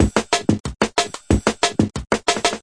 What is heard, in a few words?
DRUM LOOPS and BREAKS, 184 bpm; 181 bpm plus